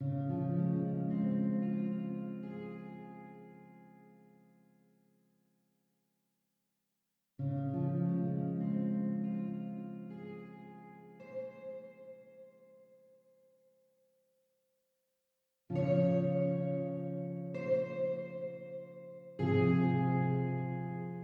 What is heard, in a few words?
ambient; PhoenixCraft 1.16.5